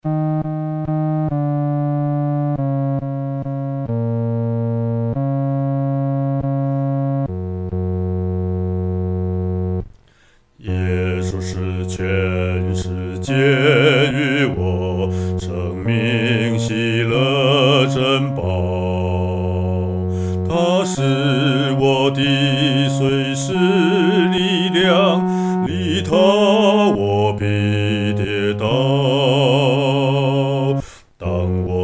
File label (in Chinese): 独唱 第四声